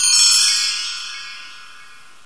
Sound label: heal strike